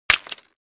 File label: Brick Hit Hammer